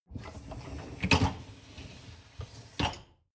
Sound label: dishwasher upper tray draw out